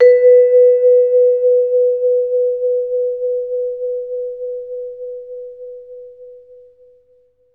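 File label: vibraphone B3; Best Service; Peter Siedlaczek's Advanced Orchestra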